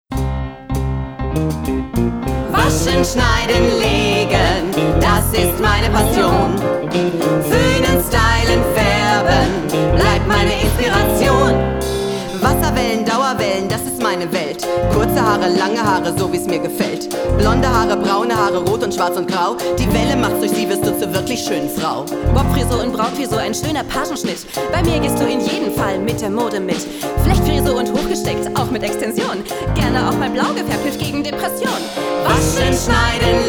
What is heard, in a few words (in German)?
der dauerwellen rap